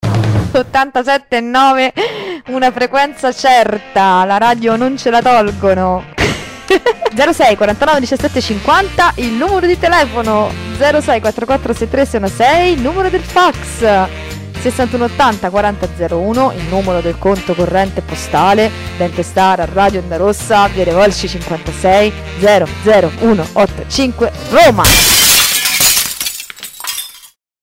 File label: jingle; r.o.r.